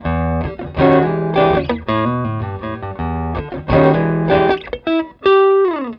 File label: Gtr Mix Creative; Mixing Secrets